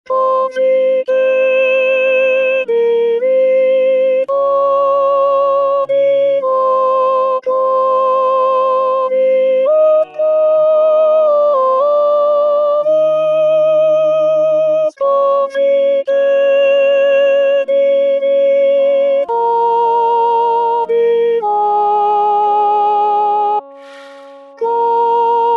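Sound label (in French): Soprano confitemini domino, re bemol majeur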